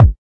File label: kick